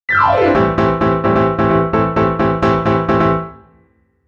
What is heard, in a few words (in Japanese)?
ジングル